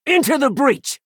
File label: grunt attack; imperial